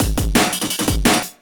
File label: 170, Breaks; Drum&Bass; EZ Rollers